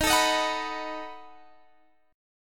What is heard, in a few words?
B 7th Eb